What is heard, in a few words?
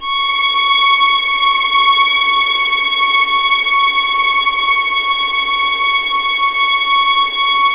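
C#6 legato violins; East West; The Ultimate String Collection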